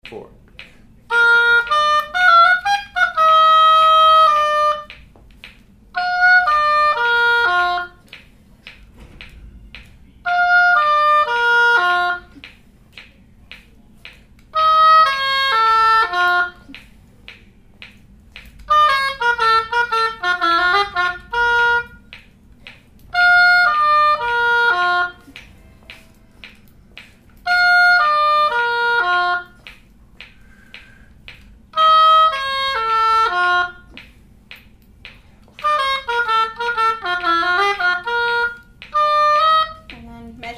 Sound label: Bayside Oboe